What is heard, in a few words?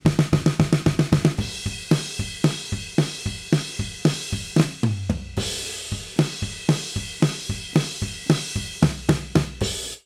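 drum mono overhead, Mono Drums; Haas experiments using a drum track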